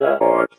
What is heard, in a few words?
Ghoul Voice